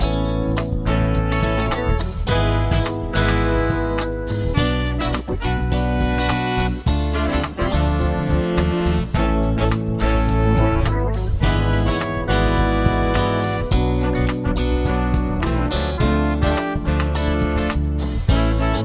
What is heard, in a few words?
chord prog with mixture; harmony